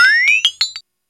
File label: RAVE